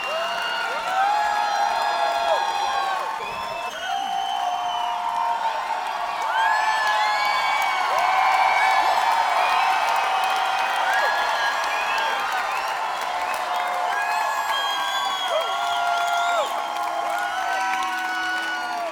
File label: Encore Noise